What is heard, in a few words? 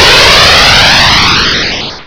TAKE OFF, Aerei; trasporti